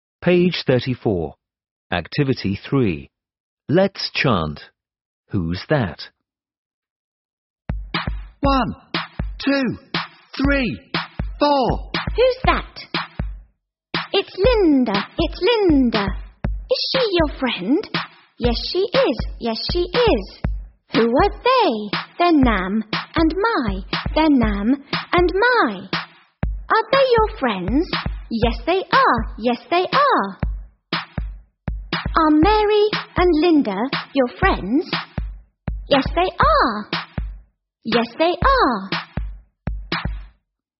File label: Lets chant; Tieng Anh lop 3 Unit 5 Lesson 3 trang 34 35 Tap 1